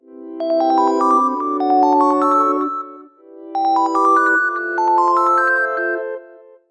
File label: Green Alarm; Explay Golf; alarms